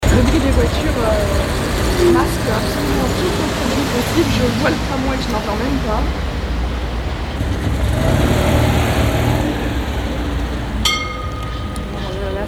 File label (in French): masque tram